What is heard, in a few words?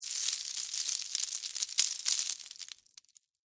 Debris Penne Rigate; Texture; BlastWave